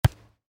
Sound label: Volleyball (Exterior): Hit Medium; Sports; Miscellaneous Sports